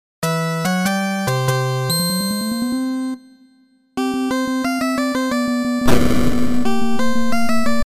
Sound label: transformer dead